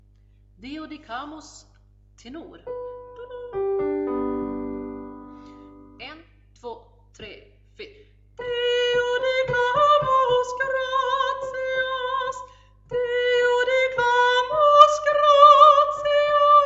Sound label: Deo ten